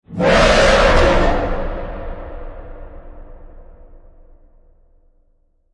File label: Fiends Scream; Ninja Tracks